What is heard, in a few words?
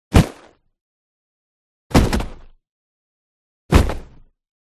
OBJECT IMPACT Sand Bag Drop; smartsound